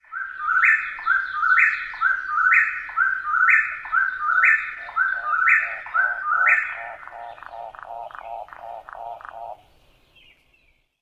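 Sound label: song and aug-aug-aug calls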